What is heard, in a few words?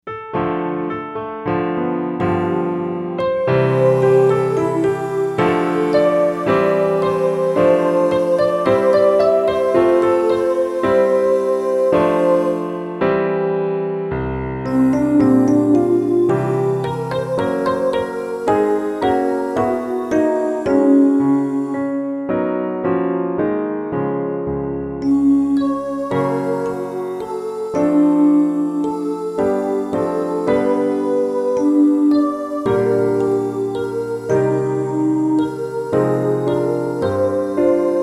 PIANO Study LOW